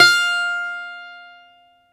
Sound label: balalaika, Russian strings; World Combos; E-MU Producer Series Vol. 7 – Old World Instruments